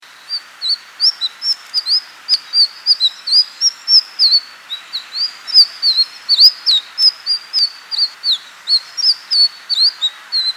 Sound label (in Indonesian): sikatan ninon